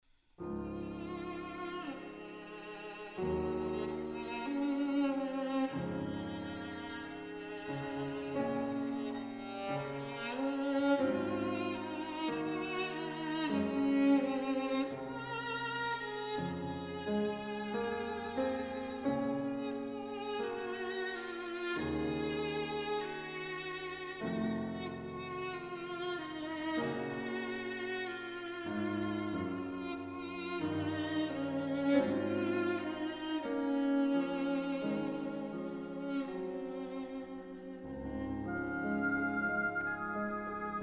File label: quintet; dubois